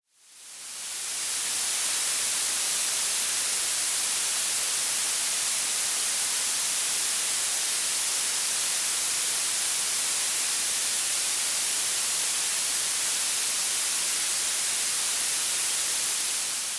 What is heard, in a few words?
noise blue